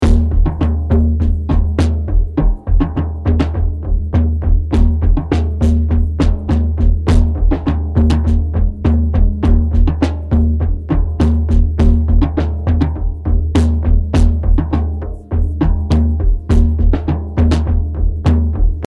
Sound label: dark reso drums IN